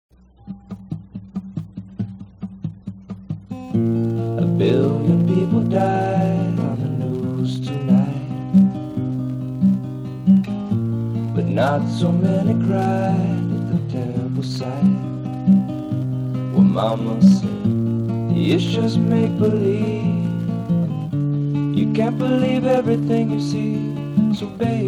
lullaby acoustic

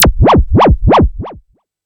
Zap Wobble Hard, FX Hit; Drums; Ableton Live 12 Lite; Core Library

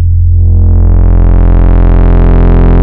Vector Sprite Basics Sub; Ableton Live 12 Lite; Sprites

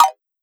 E-Perc Clop, Electronic Percussion, Drums; Ableton Live 12 Lite; Core Library

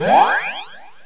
login; Zelda

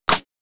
Clap, One Shots Drums; RK TD1 Uluru; Ableton Live 12 Lite; Core Library

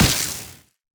aether medium; action; impact

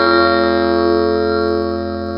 Instrument Bell; Ableton Live 12 Lite; Vector; Sprites

